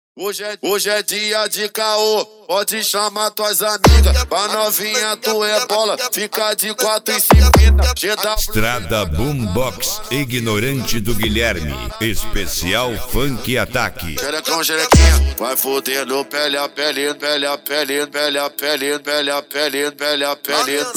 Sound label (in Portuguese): esp funk atack